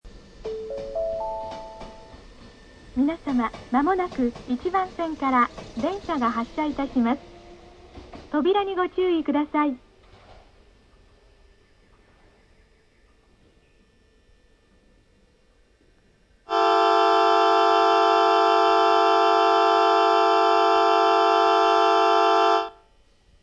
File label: shirasagi bell; nankai